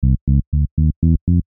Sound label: Musical Bass Ascend; Miscellaneous Musical; Blastwave